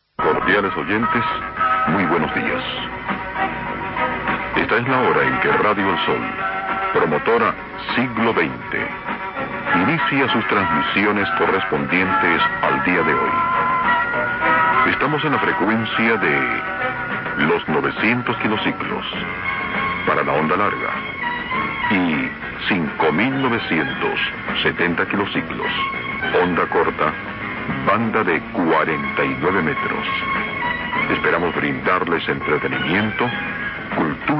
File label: R El Sol Lima 5970 kHz; Peru